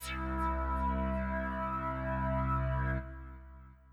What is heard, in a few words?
gun pickup background; PS4; ChromaGun; iOS, Linux, macOS, Switch, Windows, Xbox One